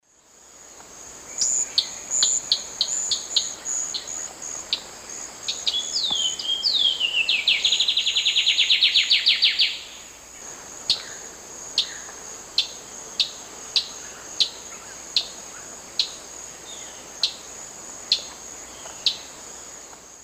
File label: Basileuterus fulvicauda, call and song; S. America; Eastern Lowlands